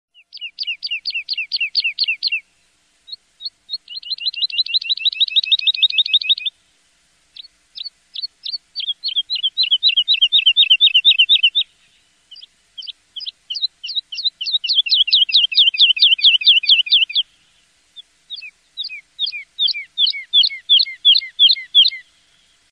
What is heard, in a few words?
bird world tone ringtone